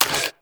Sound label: Pistol Mech; Warframe; Tenno; Priest; Weapons